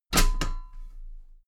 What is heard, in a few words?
step metal ladder step top heavy